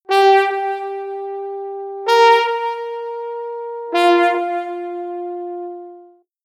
Trombone Pitches

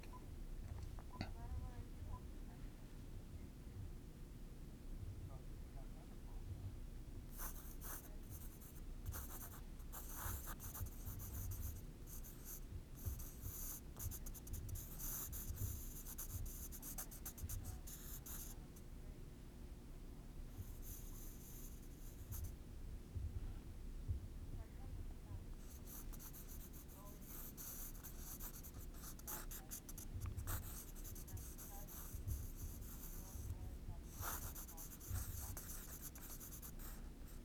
Pencil Sketch

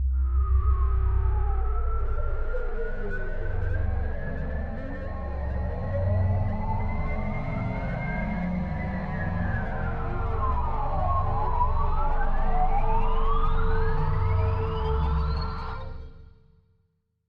Uneasy Riser

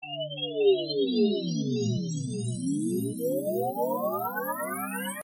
Space Effect

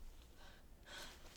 Boy Heavy Breathes

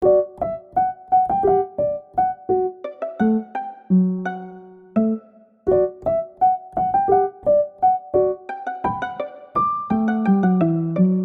Fun Relaxing Piano Music

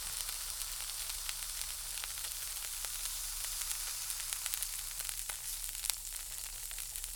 Burning Frying Soft Cigarette Smoke

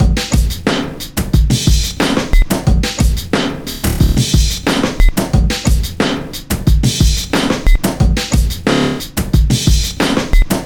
Flexi Drum

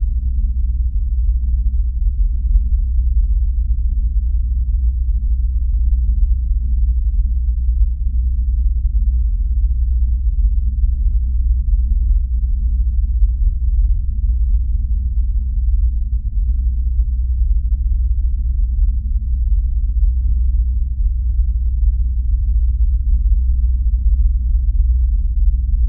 Spaceship Interior Background Noise

